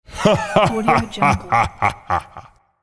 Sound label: santa claus laughing